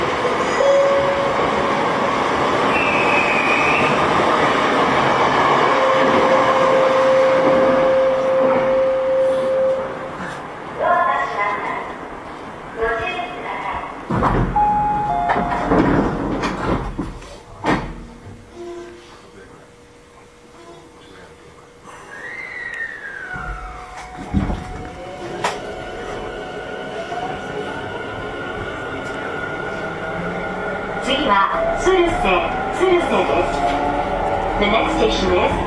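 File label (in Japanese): 走行音, 50000系, 車外ブザー入り; Tobu; ふじみ野-鶴瀬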